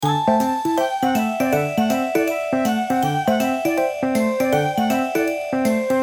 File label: Short mistery